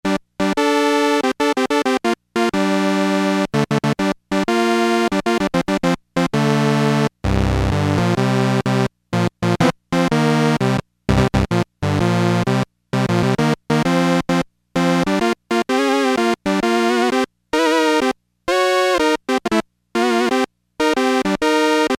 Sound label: Synth 5 - detuned 5th, Crumar Trilogy; Keith Emerson style